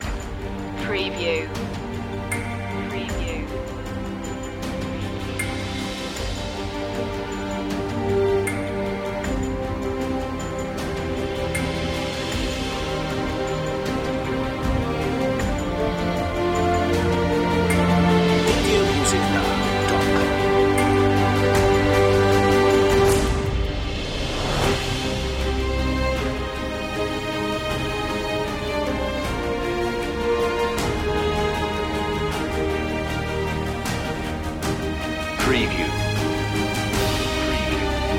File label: no choir synth